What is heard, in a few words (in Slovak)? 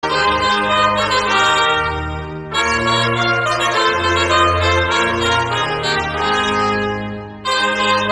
trubka; hudobný nástroj